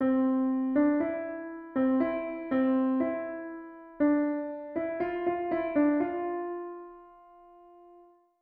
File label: doremi